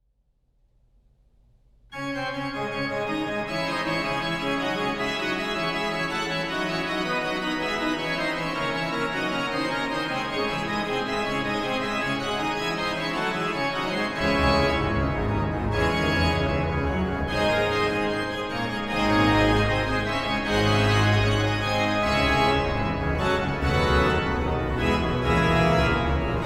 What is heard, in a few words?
Dorische Toccata BWV 538